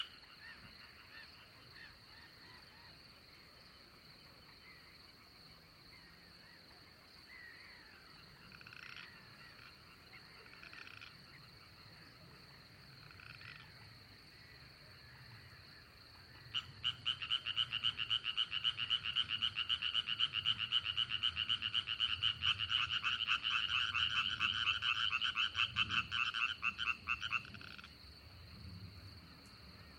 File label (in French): chant Rainette verte; MNHN SO